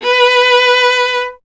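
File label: STR VLA B2, strings viola; Miroslav Vitous; Solo Instruments VOL-1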